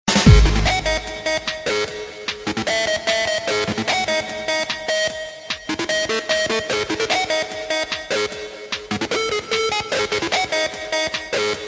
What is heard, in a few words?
Techno; Voodoo